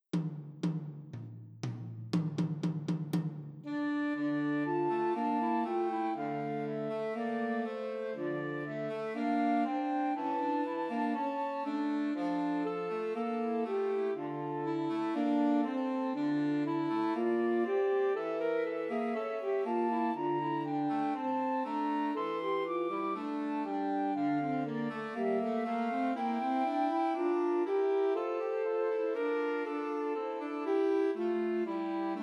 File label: Trios for Flute Alto Sax and Tenor Sax; Christmas Trios